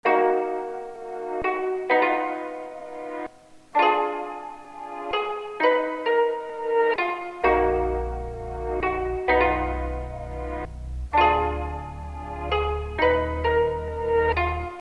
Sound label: LOFI CHORD